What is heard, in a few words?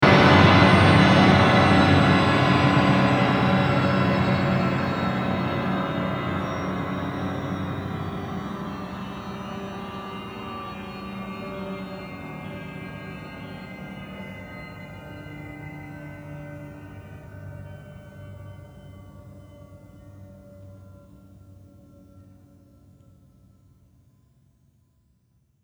Soft Explosion plus Slow Falling